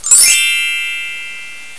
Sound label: chime